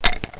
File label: stick